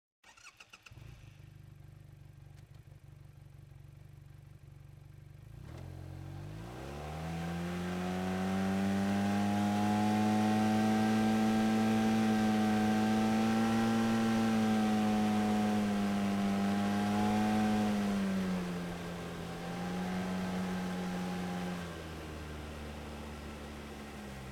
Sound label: Textron Wildcat Sport - ONBRD - MEDIUM - Start Drive Stop Reverse Stop Drive Stop Off; Pole Position Production; Textron Wildcat Sport 2018